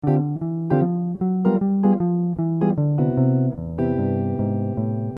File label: chord